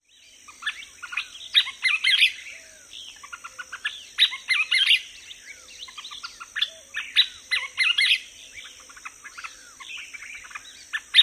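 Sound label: SCTAFL dawn song, scissor-tailed flycatcher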